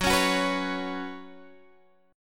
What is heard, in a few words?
Gb Suspended 4th; Gbsus4 strummed; x,9,11,11,12,9